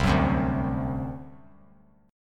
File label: C Suspended 2nd Flat 5th C down; Csus2b5 strummed